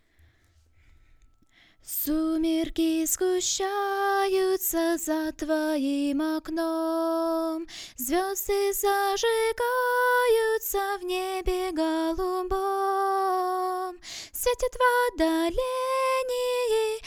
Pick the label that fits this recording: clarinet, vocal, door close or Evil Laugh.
vocal